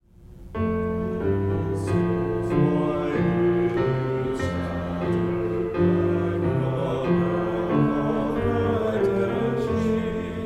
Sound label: bass and tenor; There's a Wideness in God's Mercy; Gather Hymnal